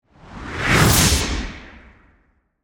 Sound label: Magic Spell Pass By, Horror, Magic, Spell, Pass, By, Whoosh